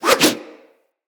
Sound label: Axe Small Spin Swing; Weapons; Swings